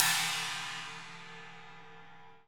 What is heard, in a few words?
CHINA, Salsa Kit; Drums&Percussion; E-MU Producer Series Vol. 7 – Old World Instruments